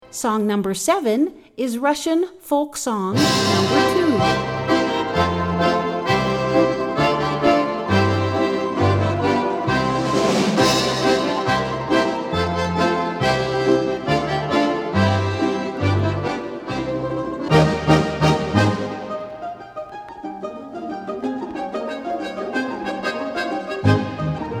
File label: Russian Folk Song